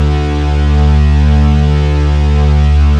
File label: OMNI REEDS, ARP Synths, Analog Odyssey; E-MU Formula 4000 Series Vol. 3; ARP Synths II